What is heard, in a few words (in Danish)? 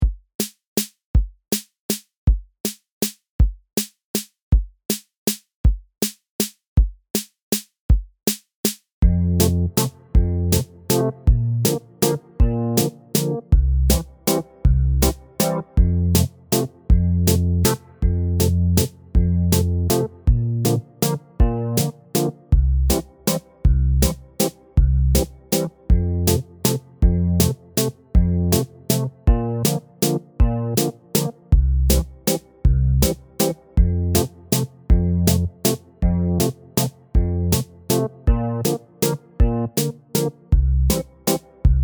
minus vokal; Sangbank